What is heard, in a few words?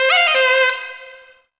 Sax Tag; Sonic Foundry (Sony Creative Software); Knutrix Drum & Bass